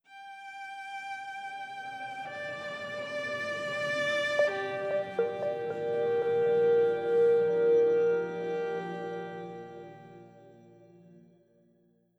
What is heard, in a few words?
sonic chapter long; publiccodepodcast